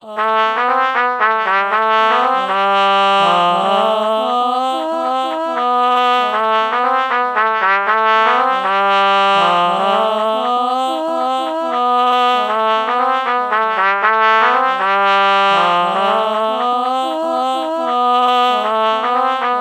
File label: ah trumpet melody morph low